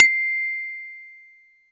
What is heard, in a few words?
Synth Bells; Dream Zone